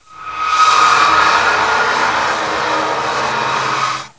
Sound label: Screech Metal Long Verb